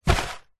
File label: pet mouse rodent food bag set down